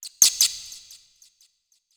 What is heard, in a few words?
environment forest bat cackle; gamerip